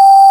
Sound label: BLOW G3, SYNTHESIZER STACK; STACK SYN #2